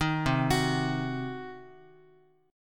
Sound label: C Diminished C, Guitar 4A,4E,5A,5D,5G sharp,6C sharp; arpeggiated; JGuitar